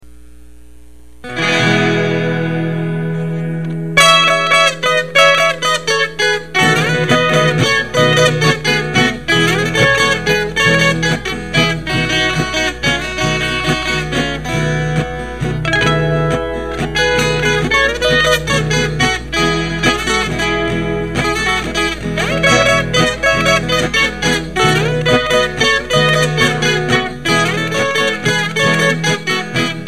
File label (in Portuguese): instrumental